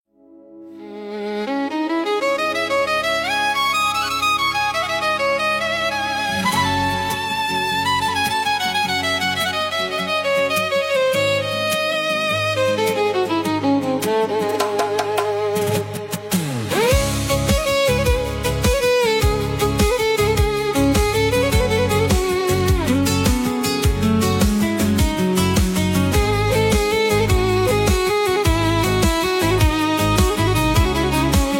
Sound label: deep emotional remix